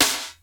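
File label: EQ SD, snare drum; Crypton - DrumBase5000; CD DRUMS #2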